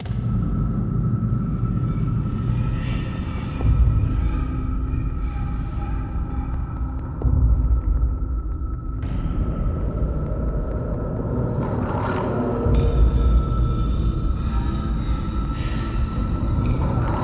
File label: Soundscape